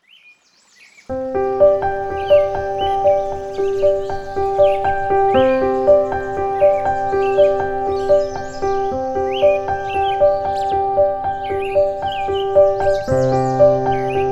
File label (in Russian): Fortepyano i Zvuki Lesa